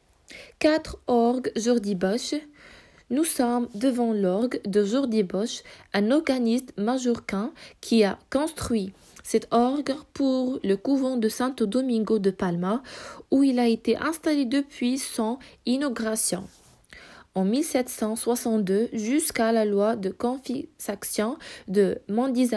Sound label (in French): Orgue